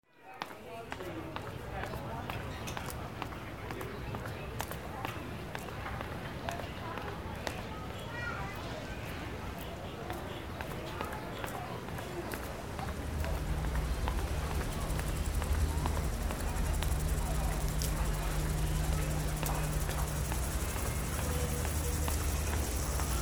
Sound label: Soundscape; investigating audio production